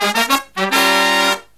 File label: Brass Riffs